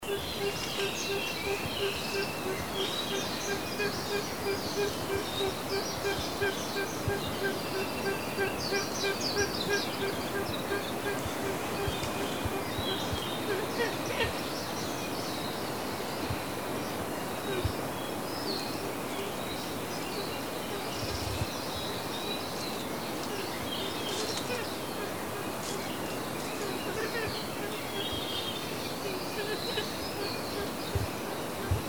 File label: Kalij Pheasant, songs